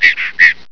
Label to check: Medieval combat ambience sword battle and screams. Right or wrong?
Wrong